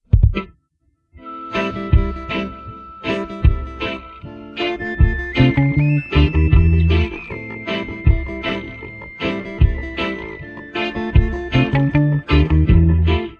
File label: bass guitar synth